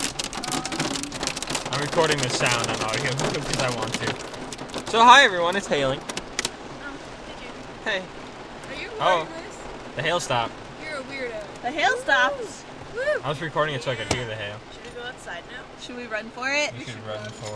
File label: hail